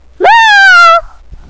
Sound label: meow